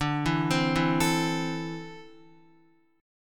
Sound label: D 7th Suspended 2nd, Guitar; x,5,4,5,4,5; 4F,4A,5C,5G,5C,6E; arpeggiated; JGuitar